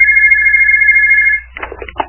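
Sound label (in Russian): писклявый звонок и поднятие трубки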